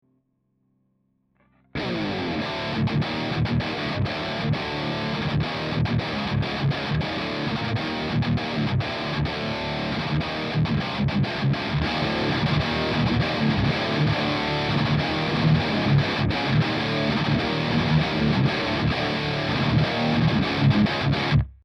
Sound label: riff boost